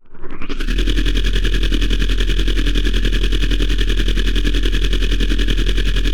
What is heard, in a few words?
Energy Gun Charge, Sci-Fi SFX; Chris Logsdon